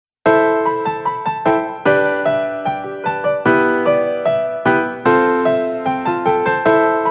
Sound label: iphone ringtone